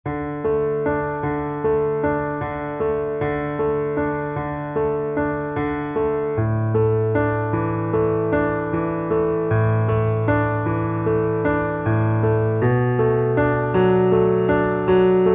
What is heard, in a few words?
Underscore PIANO; Worship Flow